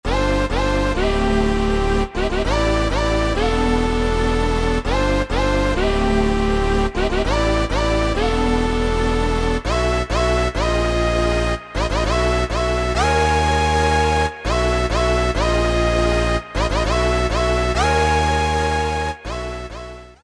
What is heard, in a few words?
LEAD; Best Service Alpha Dance II